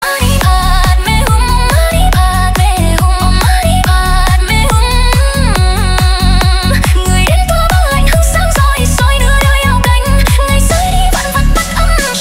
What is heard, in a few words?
remix om mani padme hum